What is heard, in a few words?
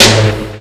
Perks; Drum Hits; Loopmasters; Jon Carter & Alex Blanco; Block Party Essentials